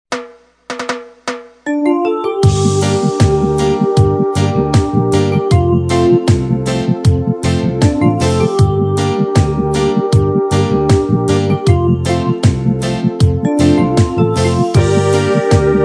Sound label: steel and voices